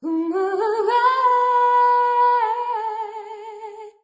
diva soul diva melody, Cheesy Divas, vocals

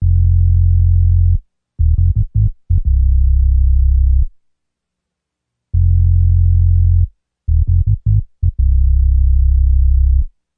bass, string n piano DnB song

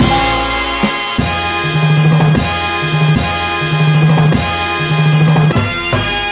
all my life horns drums; SAMPLED MUSIC LOOPS

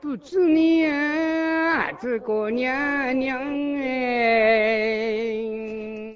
miao male vocal sung, love songs

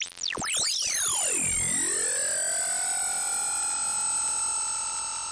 space telemetry powerup; Computery or Science Fiction

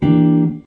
mellow jazz guitar, Chords

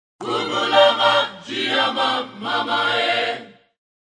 african vocals mid, African Vocals Sung

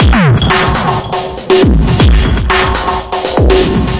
caveman club ouch, 120 bpm, drum loops and breaks

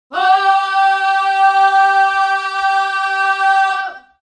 low voices male, Male Oh

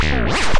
crazy squirt; Weird and Interesting Hits; single hits

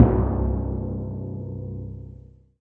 timpani med; Western and Latin Percussion; single hits

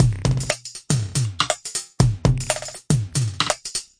delay stutter phase drums, 120 bpm

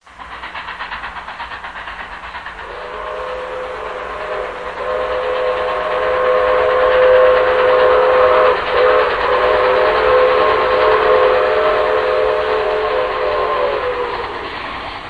lofi train; Sound FX Real Life

